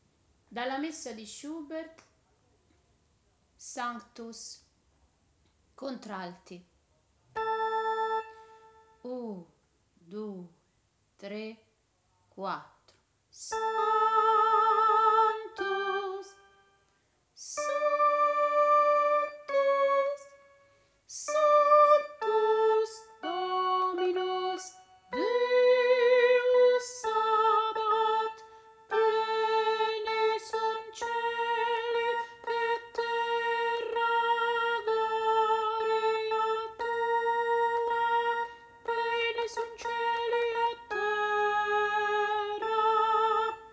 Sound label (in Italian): sanctus contralti; Schubert